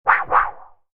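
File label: Cartoon Warble Funny, cartoon fx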